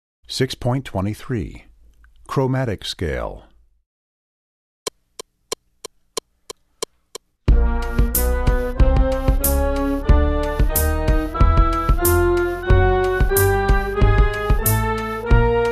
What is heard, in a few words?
Chromatic Scale